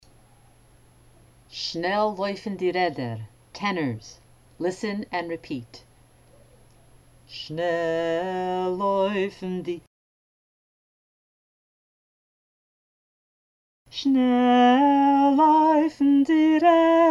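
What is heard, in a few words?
tenor drill; Shnel Loyfn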